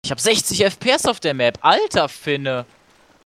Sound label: jingle